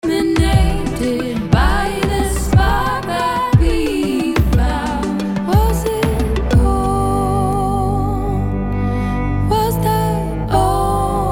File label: Cards On The Table (Electronic); Work-in-progress; Unfinished